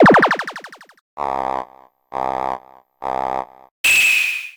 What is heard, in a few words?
hahaha, Arcade